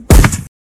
gunshot

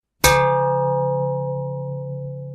metal hit sound

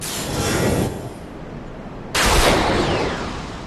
lightning spear; dark souls

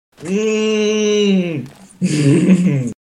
hmmmmmmmmmmmm uhuhummmmm

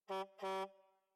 truck horn

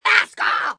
warcry; fallen

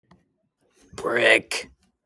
basketball brick

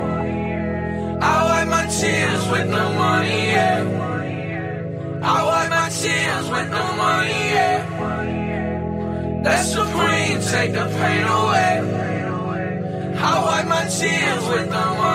goal music

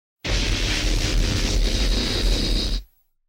beam; spacegodzilla

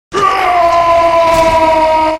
jumpscare; shrakt 3d

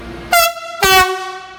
Siemens Vectron

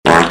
fart short n sweet

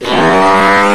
loud fard

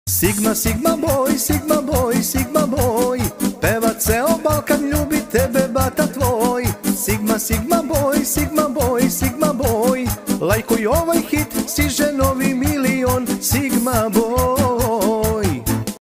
arab

